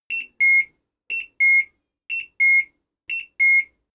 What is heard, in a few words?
dishwasher finished beep